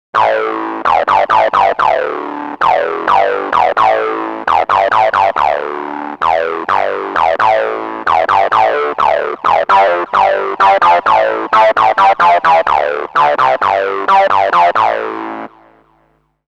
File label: sound sync